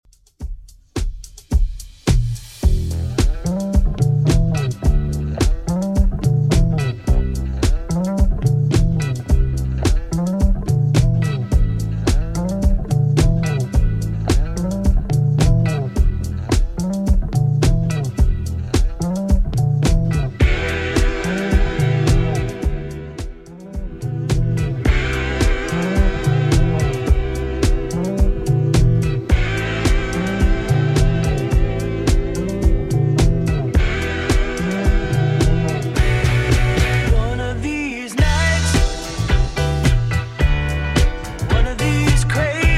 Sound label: 70s Redrum 108bpm